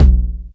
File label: LT909, TR909